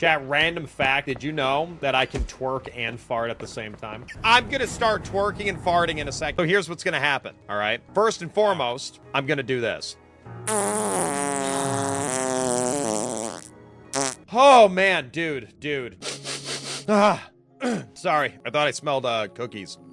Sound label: corgi twerk and fart